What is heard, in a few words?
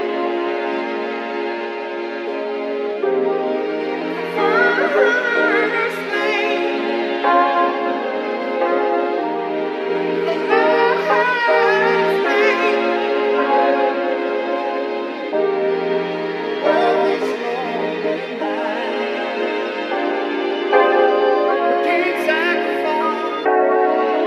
strings voice keys, Loops; Phonky